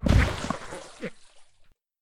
Pool Floaty Hit; Lethal Company

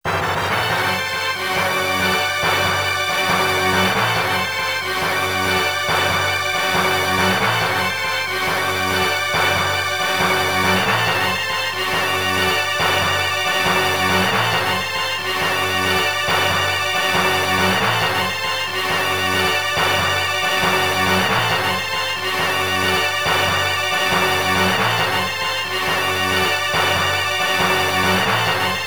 Continue; Dungeons & Dragons Collection; Saturn

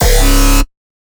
Bass Shot 200 C#; exciplex sample presets pack; JinoBeats

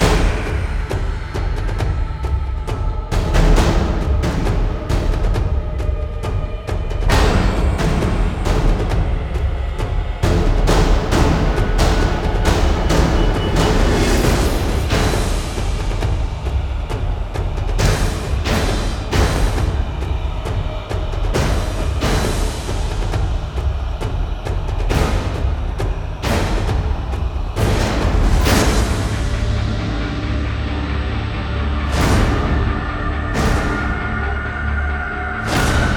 Base Loop low; extinction deluxe edition; ps4 windows xbox one

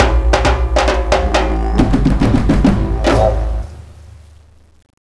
Tribal Fin; Imagine Modern Dancer; Nintendo DS

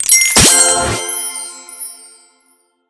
Gem Reward

